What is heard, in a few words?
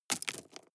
drop stones small